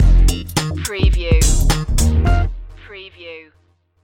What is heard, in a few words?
shortcut groove stinger